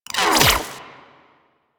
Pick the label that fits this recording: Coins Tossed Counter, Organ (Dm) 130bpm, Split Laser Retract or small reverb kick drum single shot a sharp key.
Split Laser Retract